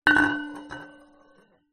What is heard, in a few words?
household flask metal down on table and scrape